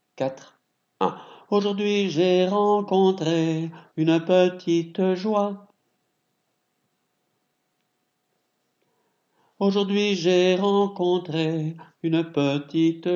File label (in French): Petite joie hommes, chorale